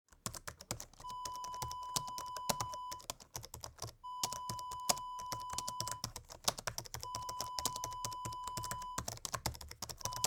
laptop typing

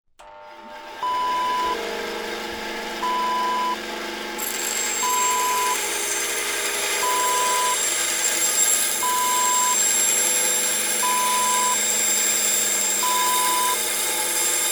band saw

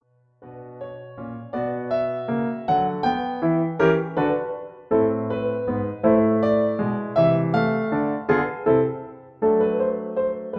Accompaniment B

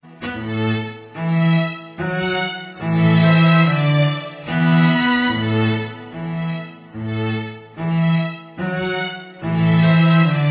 Marching Thriller

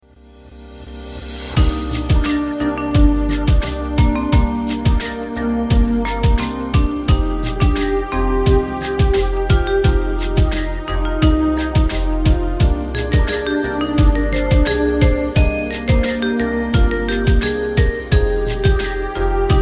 LOUNGE

